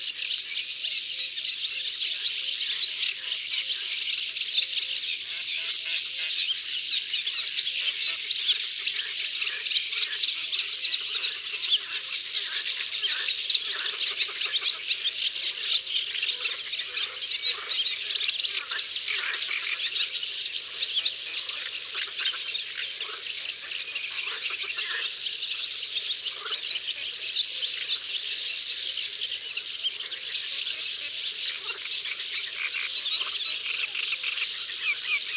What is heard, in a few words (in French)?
Etourneaux sansonnets, Concerts naturels de l'Isere; Studio Les Trois Becs